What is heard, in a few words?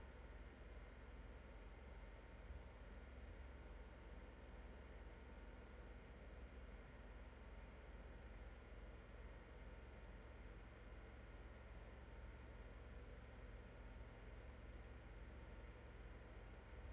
noise